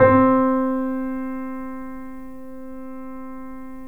C, Piano; InVision Interactive; The Piano

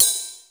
RIDE, DRUMS; Crypton - DrumBase5000; T1 DRUMS

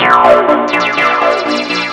Synth Ultra Bass; Sonic Foundry (Sony Creative Software); Modular Electronica; Basses

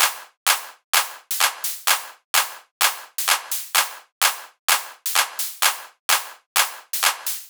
Loops 128BPM, Drumloops

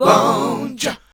Voice Spectral, H PHRS

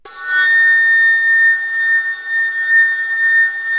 ALTO A5, ALTO FLUTE; Historical Instruments; AKAI; USB Soundscan vol.23

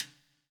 POWER RIM

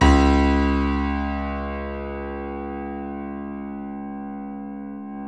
DB1, YC7 Piano mf

